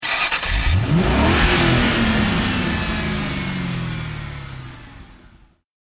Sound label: mustang ignition; fordmustang